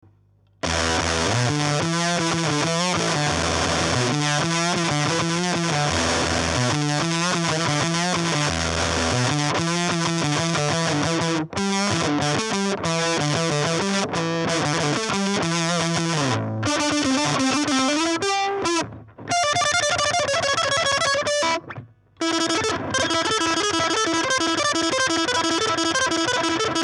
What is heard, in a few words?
oct FUZZ